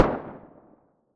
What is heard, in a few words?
tt33 muzzle mono very far